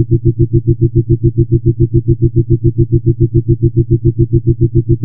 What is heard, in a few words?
filter